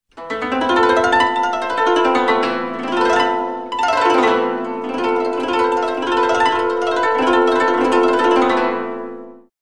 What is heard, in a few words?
Koto Glissando Ascending Descending Straight Wavy; ShamiKoto; Syntheway